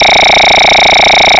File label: Tilt